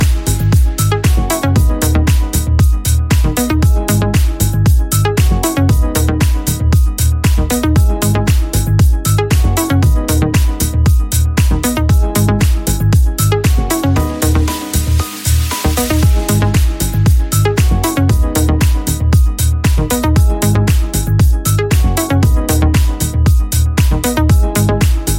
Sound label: Samsung Ringtone Remix